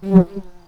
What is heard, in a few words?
bumblebees, Hypnorum workers